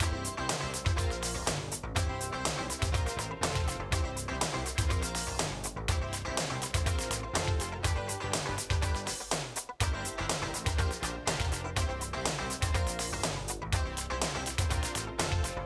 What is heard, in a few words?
Upbeat; bratz super babyz; ds windows